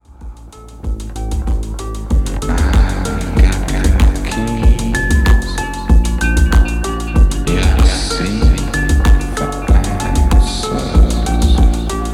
keys

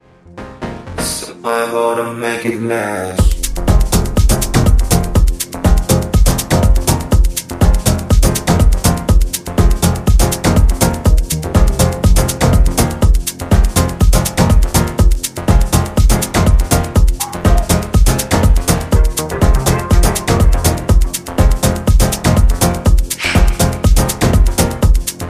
dub

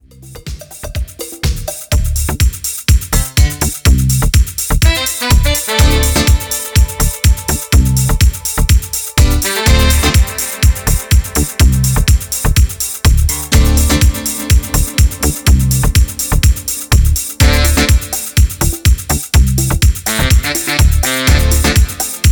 piano parfait

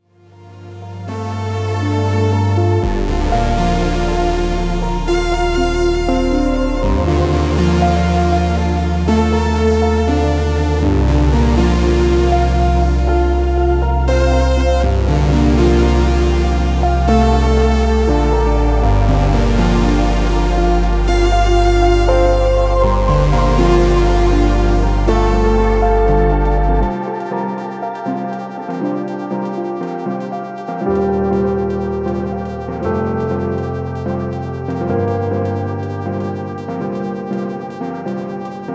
ambient remix

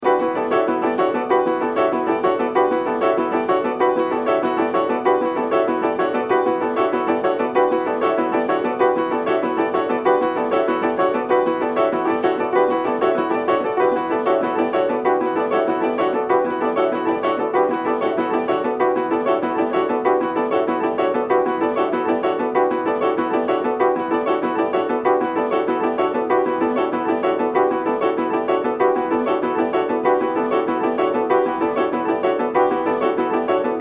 six pianos

